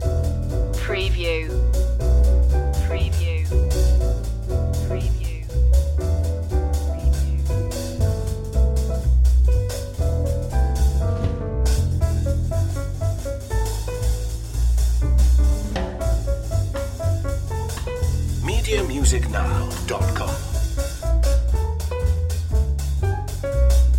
swing loop

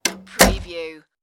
apartment door close

